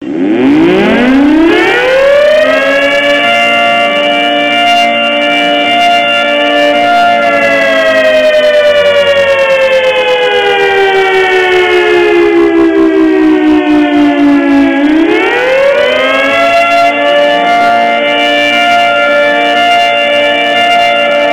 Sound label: 3t22 fire attack, sirens; Federal 3t22 Hi-Lo Attack